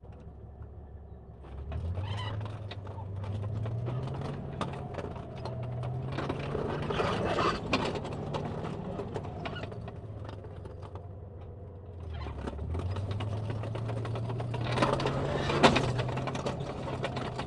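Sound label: panzer iii onboard slow drive uneven stop tracks right; Sonniss GDC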